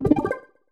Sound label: user joined